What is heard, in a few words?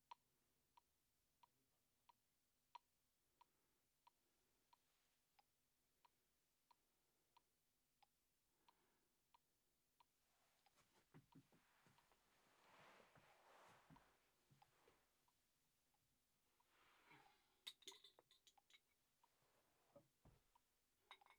Vocal